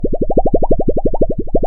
propeller cartoon loop

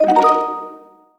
get important item

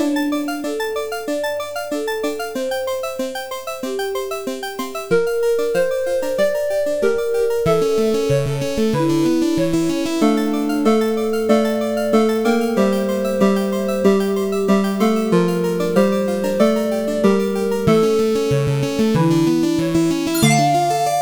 chiptune revamp

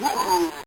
enemy death, bitcrushed voice one shots

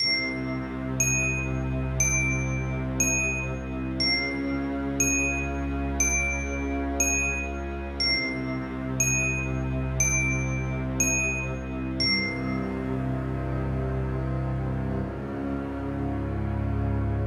Ice Cavern

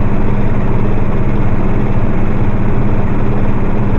engine heavy loop

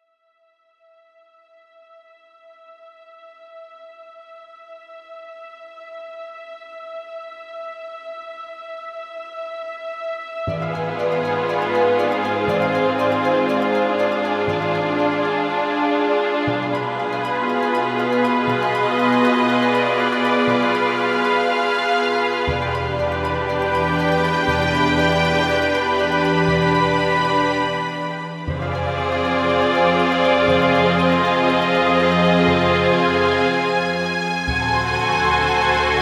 Charmed Forest

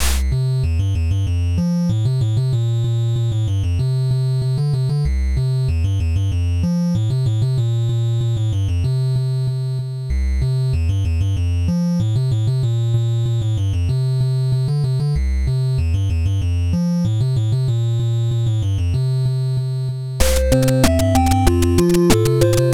NES Version; Celta World

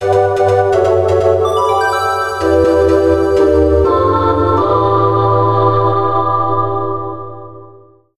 jingle

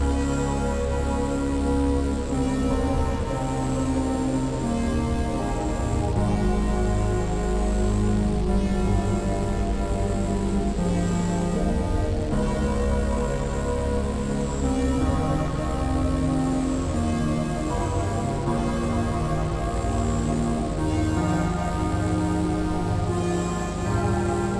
gameover; player dies in my submission for Blocktoberfest 2025 Diamond Dust; Made in Jummbox

